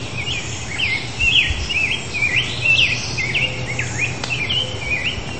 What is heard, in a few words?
Pheucticus ludovicianus; Chordata; LandBirds